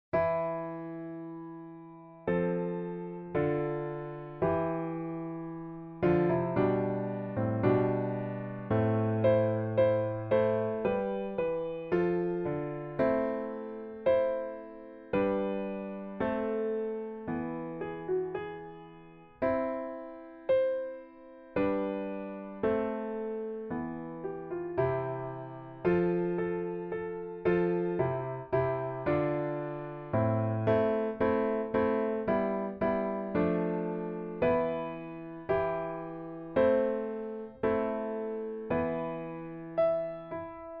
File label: PIANO; MILLE REGRETS